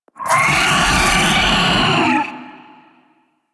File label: Vocal Pain, Enemies; Warframe; Teralyst; Ropalolyst